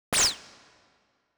laser

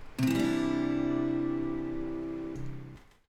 Cadd9, guitar

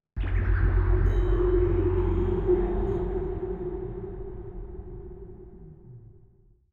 ghost

